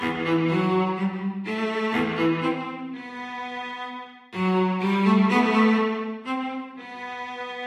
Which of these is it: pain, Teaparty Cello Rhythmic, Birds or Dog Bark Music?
Teaparty Cello Rhythmic